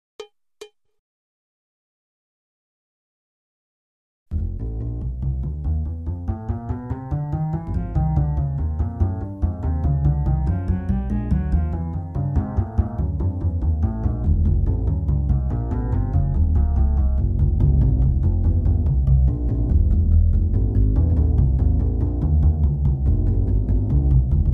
Jazz Brushes Loop, Split Mix

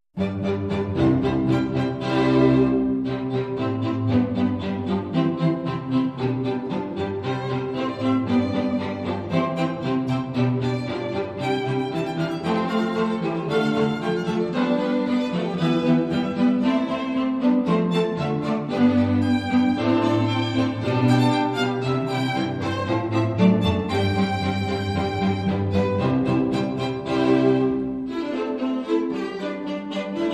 Stately, Light Strings

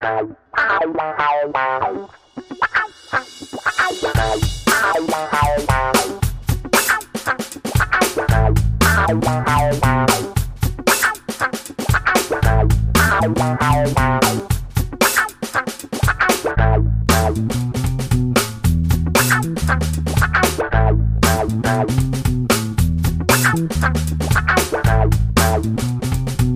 Dance

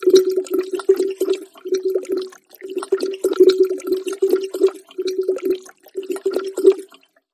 Forbidden Elixir Bubbling

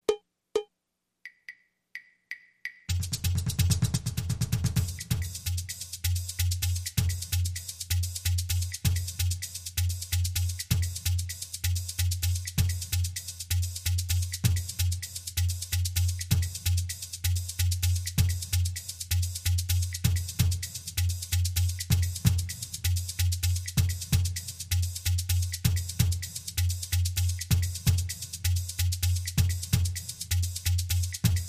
Cuban Loop Hand Percussion Only

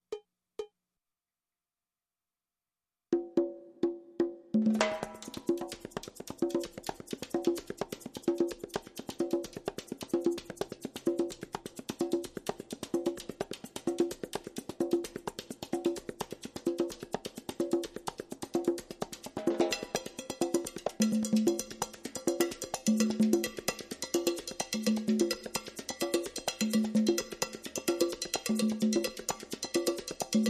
Cuban Loop

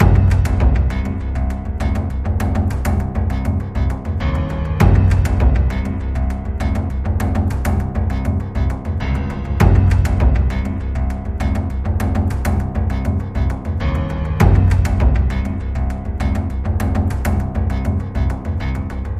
Clockwork, Loop

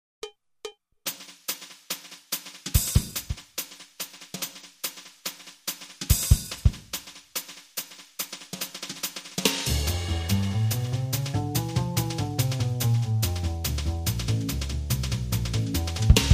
Jazz Brushes Loop

